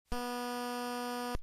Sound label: medium frequency periodic noise